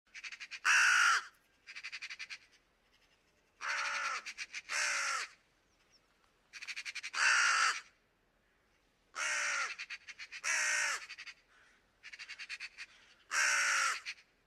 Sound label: raven, ambience; YesWeCamp